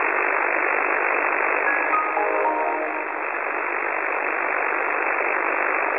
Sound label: websdr recording 4836.0kHz